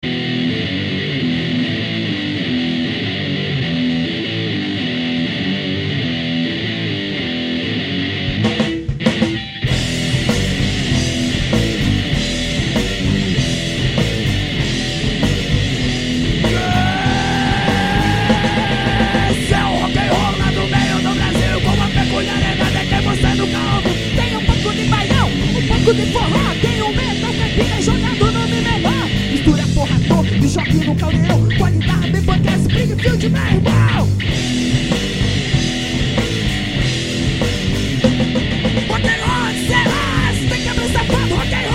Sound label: rock'n'roll